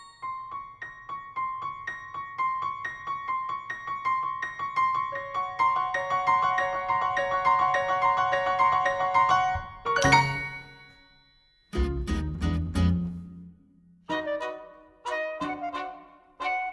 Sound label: Audition Cut Instrumental Only